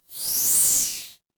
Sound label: transition sweep